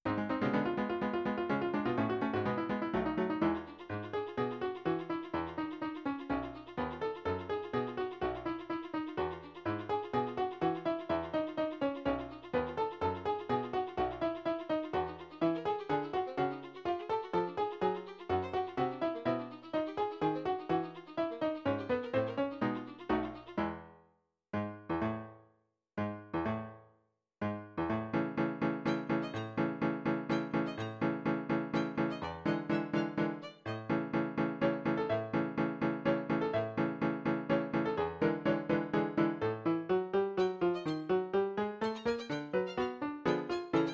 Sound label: drunken violin